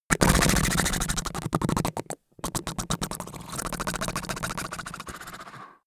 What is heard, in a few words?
lips smacking long; comedy-cartoon; koerpergeraeusche